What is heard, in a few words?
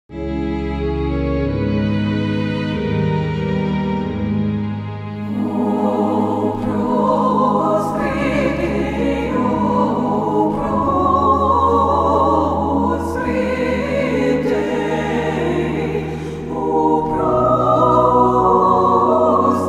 Slow Amb; Sulpice; The Da Vinci Code; XBOX